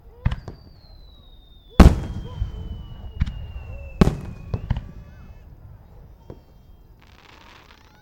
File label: firework